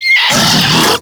Infested Diseased Ancient Pain; Warframe

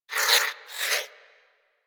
Vocal Snore; Warframe; Hunting Critters; Cryptilex

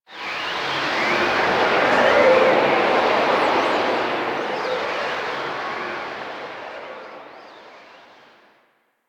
Courtyard Magic Wind; Ambience; Quest; Duviri; Warframe

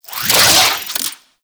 Necramech Repair Drone Claw Spin; Enemies; Warframe

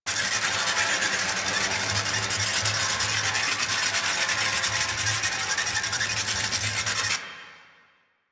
Tied Up Twirl Loop; Warframe; Khora

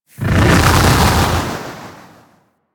Infested Splitter Flip Whoosh; Warframe; Grey Strain

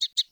Sparrow Double Chirp; Animals; Vocalizations

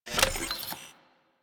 Shotgun Reload; Crp Shotgun

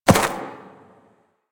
Ram Step Single Grass; Animals; DE; Warframe; Quests; Duviri

